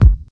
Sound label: 2000s fat bass drum g key, Royality free steel kick drum sound tuned to the G note